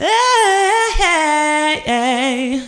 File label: AD LIB AHH, Female Vocals; PlatinumPhattCD2; E-MU Producer Series Vol. 8 – Platinum Phatt